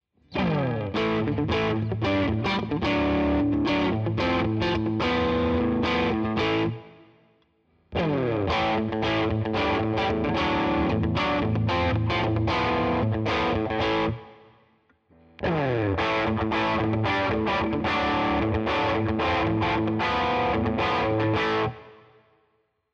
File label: mid gain All positions Riff; Cort MBM-2H